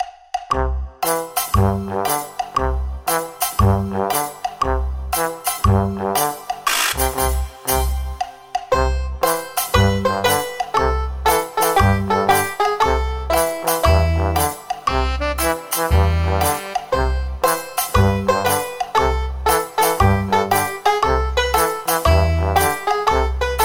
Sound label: GuitarMusic